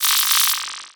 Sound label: Title Transition Data Transmission Computer; Adobe; Production Element